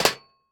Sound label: Foley Footstep Hard Sole Dress Shoe Jump On Metal Platform; Foley Footsteps